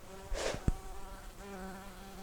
Pascuorum workers, bumblebees

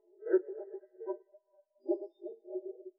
Moor Frog

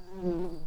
bumblebees, Hypnorum workers

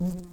Humilis workers, bumblebees

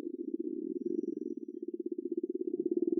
Common or Grass Frog

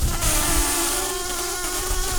bumblebees, Pascuorum queens

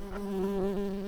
bumblebees, Hypnorum workers

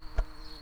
bumblebees, Pascuorum queens

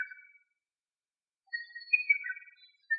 Lesser cuckoo

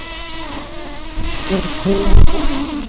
bumblebees, Pascuorum queens

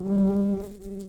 Humilis workers, bumblebees

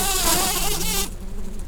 Lapidarius queens, bumblebees

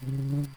bumblebees, Lucorum queens

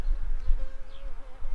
Recording, bumblebees, Sylvarum workers

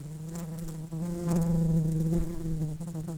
Pascuorum workers, bumblebees

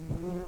Lapidarius workers, bumblebees

